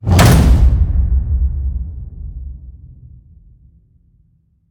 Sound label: HIT Slam, Cinematic Hits Designed